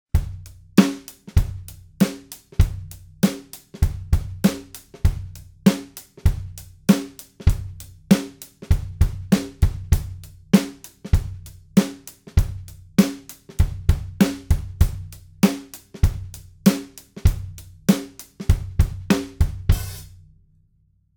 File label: Rock Drum Dual99v500